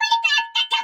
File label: Wizard Mini Reload